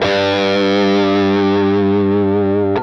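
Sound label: fuzz guitar vibrato; MAYFUZZ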